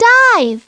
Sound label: Dive; Treasure Galaxy